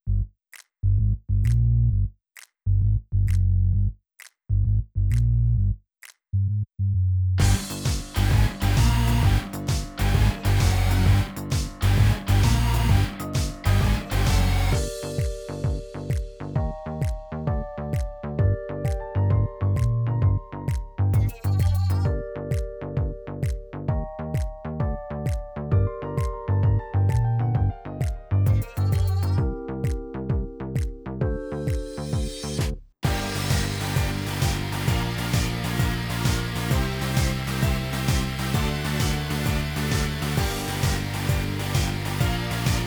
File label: off vocal